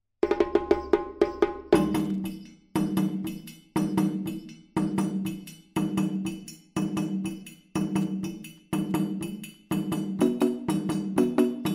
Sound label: Kakilambe Opbouw, djembe